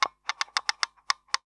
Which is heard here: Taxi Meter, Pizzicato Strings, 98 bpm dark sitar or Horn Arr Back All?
Taxi Meter